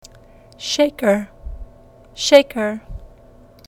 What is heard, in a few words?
shaker